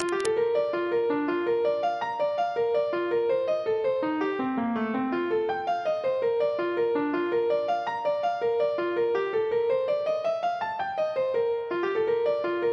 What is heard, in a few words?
hornpipe; boeuf trad